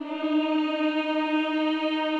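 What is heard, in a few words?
choir E flat 3, Ooh; Sound & Vision - Gigapack I CD 2 (Roland)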